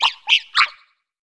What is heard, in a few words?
bird mockingbird